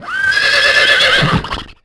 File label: horse whinny; animal; Tony Hawk's Project 8; XBOX